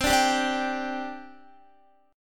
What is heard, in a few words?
C Suspended 2nd C Guitar; strummed; Csus2; x,15,12,12,14,14; 4E,4A,5D,5G,5C,6F